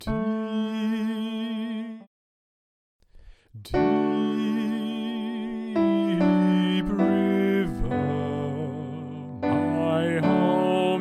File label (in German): Tenor mit Klavier Tutti; Deep River